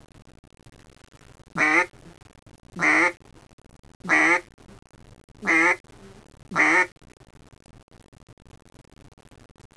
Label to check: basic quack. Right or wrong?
Right